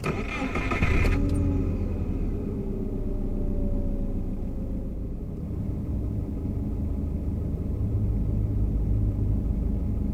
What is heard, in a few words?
car start and loop; vehicles; garrysmod; sincar